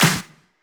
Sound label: Pre-Shifted Claps; 20ms; Vengeance Samples